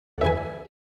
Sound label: watching you; Samsung Galaxy S10; AvatarEmojiSticker Beyond